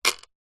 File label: household jewelry bracelet carved bone set down wood